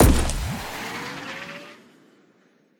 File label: launchpad use; cstrike; pubg